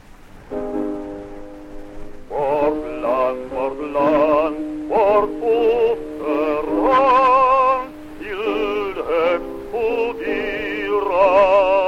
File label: Gramophone